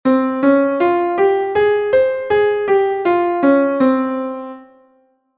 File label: in scale; japanese scales